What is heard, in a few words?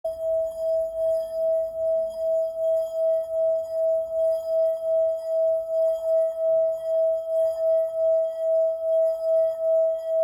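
Wheel of Life E, singing bowl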